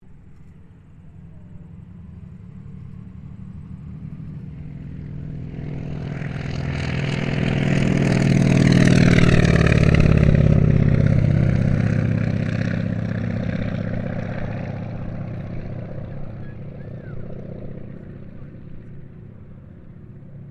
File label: AIRCRAFT CAC WIRRAWAY TAKEOFF; CA-16 Wirraway; Airplanes; Vehicles; Aircraft Collection; Twistedtracks